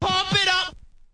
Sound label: male singing